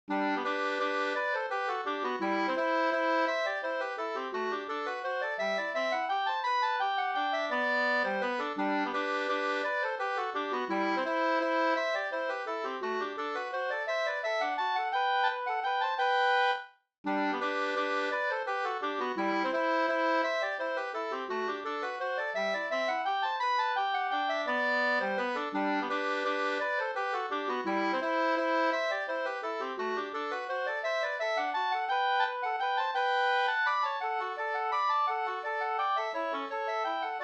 vals; M Falth Varnamo

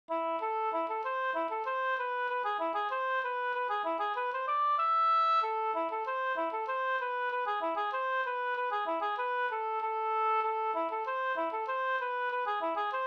jenkka; Vihtorin jenkka Nb

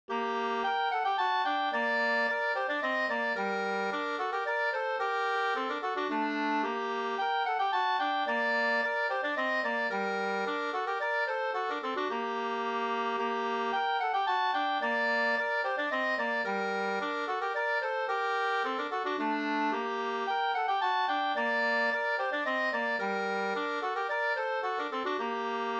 polska Bodapolska

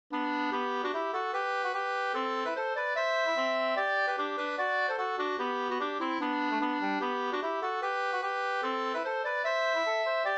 reinlender

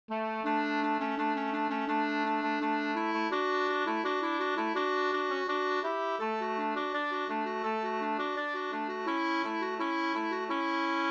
folkdans; Rumlekvadrillen dk 2st

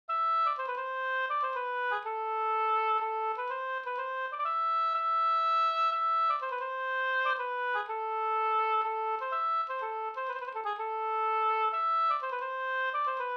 polska Bodapolska; A vill int du Kulldansen

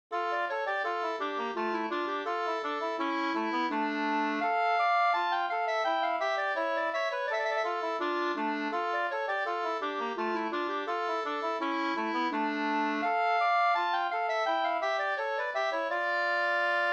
polska D moll

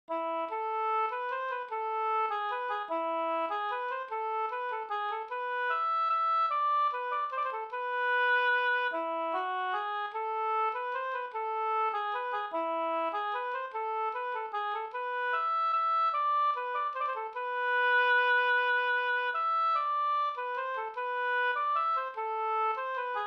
vals; August Stromberg

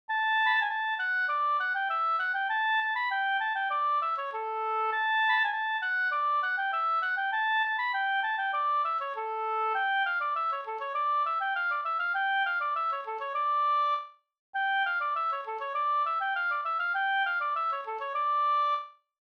halling; fr norra Bh Dybeck Runa1845SLnr8

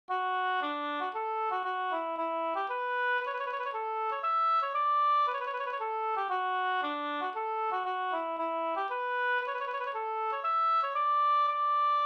polska Bodapolska I dur och moll

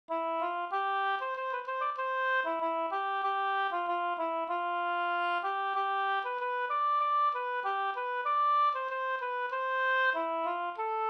ganglat; Leksands kompanis bevaringsmarsch

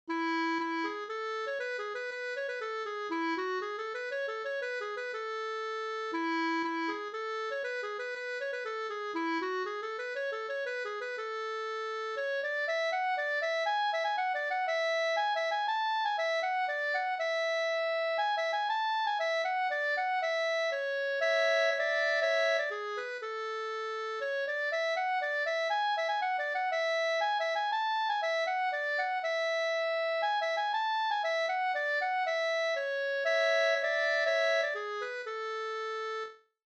polska springlek

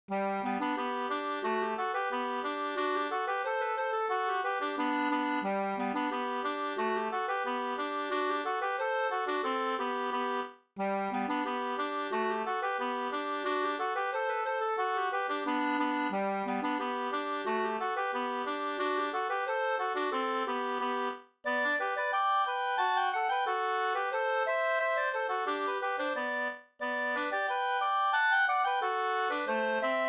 folkdans; Attamannadans fr Odsmal 2st

